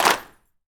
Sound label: Riddim Clap